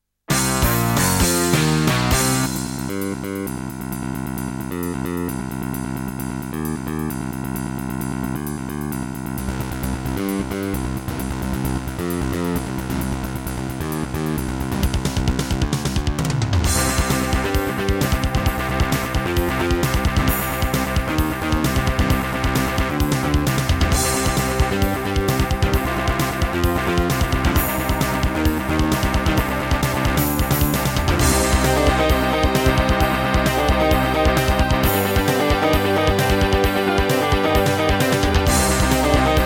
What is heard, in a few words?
Roland PMA-5; sigil doom episode 5